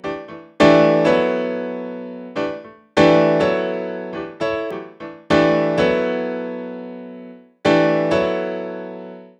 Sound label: NuJazz Piano E; Digidesign; Pro Tools Essential Demos; Essential Loop Demos; Demo Session - Jazz